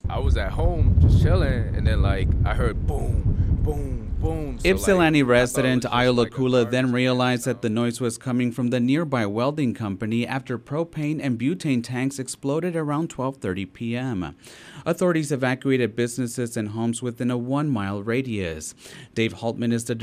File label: Explosion